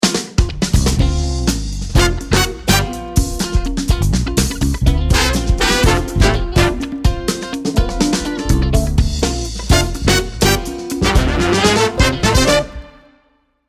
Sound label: start action, music